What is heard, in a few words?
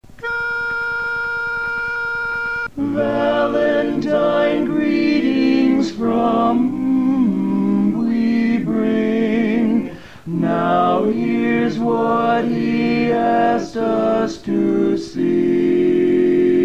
LEAD Bb Greetings, barbershop quartet